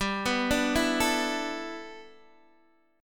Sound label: G 6th Add 9th G, Guitar DADGAD